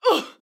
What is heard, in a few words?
woman injured grunt